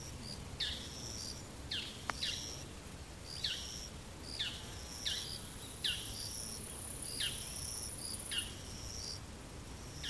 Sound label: bird mozu; animal